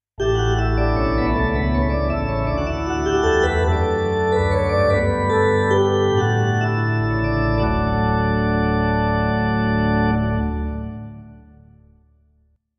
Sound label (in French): orgue d'eglise